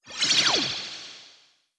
Gacha Transition; blue archive

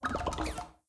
Conquest Occupy Complete; blue archive

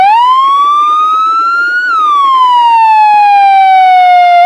wail, sirens; code 3 h2

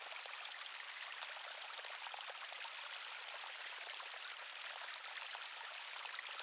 BG Water Fountain; blue archive

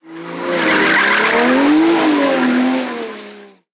Vehicle Engine; blue archive

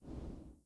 UI Fog Open Challenge; Conquest; blue archive

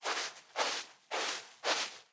Sand Foot Step; blue archive

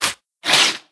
Tear Paper; blue archive

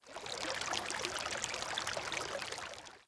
Onsen; blue archive

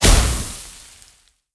Sand Blow; blue archive

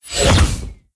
Drone Spawn; blue archive

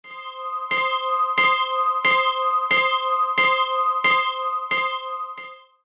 Train Alarm; blue archive

